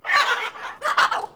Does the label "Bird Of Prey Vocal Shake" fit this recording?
Yes